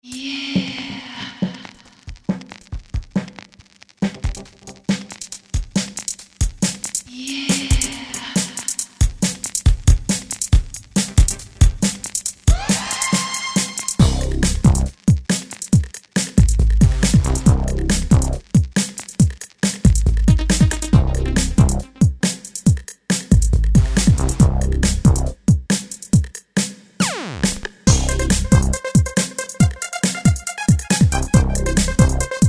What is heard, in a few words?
ELEKTRO, Yamaha DJX; Factory Demos